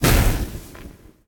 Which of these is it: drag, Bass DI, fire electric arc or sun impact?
sun impact